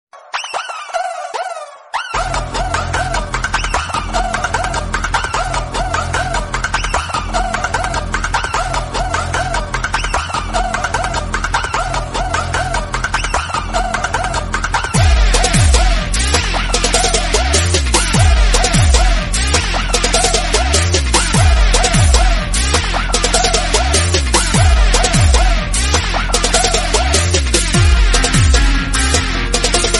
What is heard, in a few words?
Background Music; Salaar